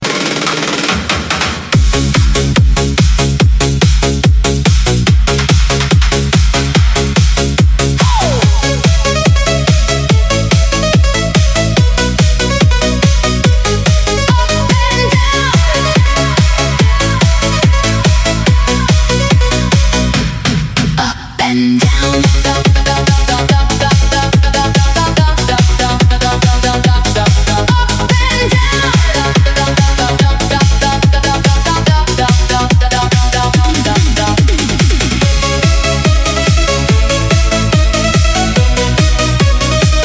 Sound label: beat reactor up and down